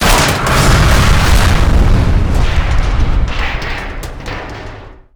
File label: Explo Large Metal; Destruction